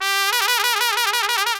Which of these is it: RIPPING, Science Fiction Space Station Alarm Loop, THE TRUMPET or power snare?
THE TRUMPET